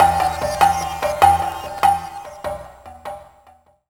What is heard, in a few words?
Bell Bongos; Jungle; Blu Mar Ten - Jungle Jungle - 1989 to 1999 Samplepack